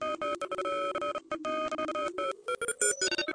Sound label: morse thick, filtered morsecode like